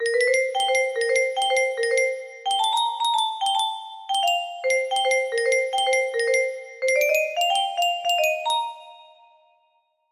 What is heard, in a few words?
melody, music box